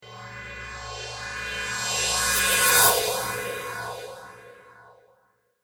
Reverse Cymbal Phaser

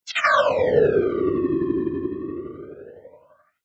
Production Element Chopper Whoosh Flange; Chopper Sound Effects; Choppers (Sound Effects:Sound Design); SFX Bible 6

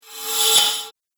Reverse Cymbal Whoosh; Whoosh Sound Effects

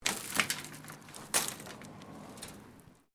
debris; Material components for constructing more complex sounds; Materials

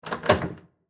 Ship Door Open Rattle; Transportation Watercraft

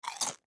Human Bite Food; Bite Food Chip Crunch; Eating; Blastwave

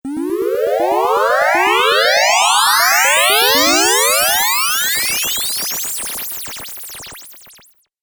Teleport Warp Video Game

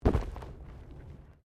Body movement Impact Roll; Body movement foley to simulate falls and deaths; Rolling; Objects

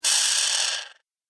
Sci Fi Factory; Production Element 2

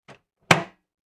Kitchen Closing Microwave; Kitchen Sound Effects; found sounds

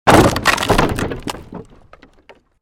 created wooden barrier smash medium; Series of dramatic events based on wooden smashes and collapses; Smash Sound Effects

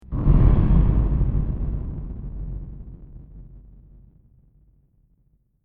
Explosion Sweetener Sound Design Element; Warfare

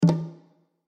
Bongos Accent Low High Hit; Drum Sound Effects

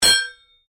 Strike Metal On Metal Heavy Ring

